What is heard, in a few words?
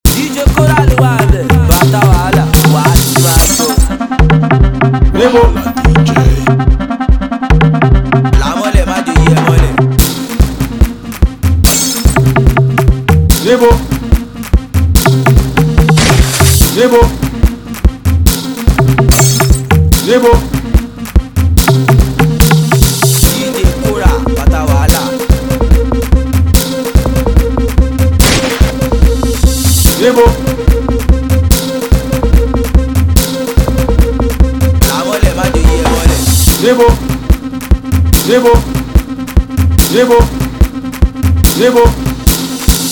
Free Beat